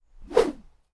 Bamboo Swing

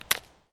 Click select

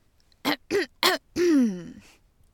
Clearing throat

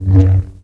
Swing

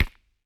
First Person Face Punch

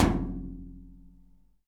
Hit Metallic Deep Hollow Thunk

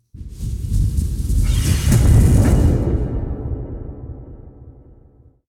Passing Whoosh With Blow

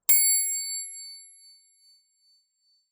Bike Bell

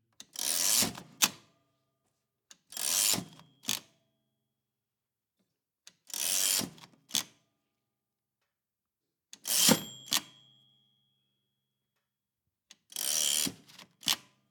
Typewriter Pullback

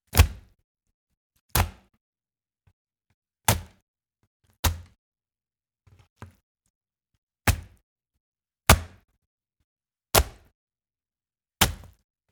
Wet Slaps

